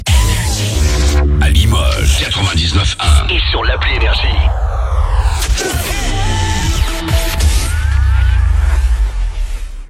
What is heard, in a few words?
JINGLE; LIMOGES, 99.1 FM; NRJ